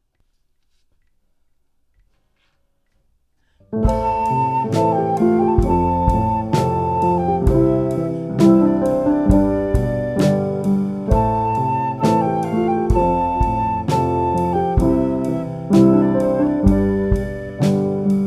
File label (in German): song mit drums; Tonstudioaufnahmen